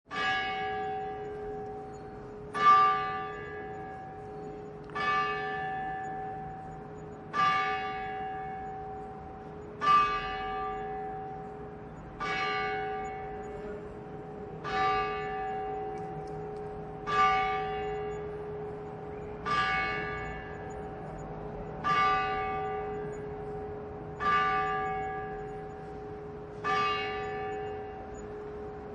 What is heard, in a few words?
church bells at noon on sunday prague